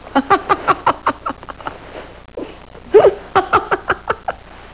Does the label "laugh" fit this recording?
Yes